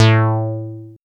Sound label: bass